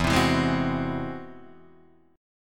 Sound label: G sharp Augmented 7th, chord